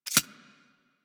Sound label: Arachnoid Micro Step; Warframe; Corpus; Steps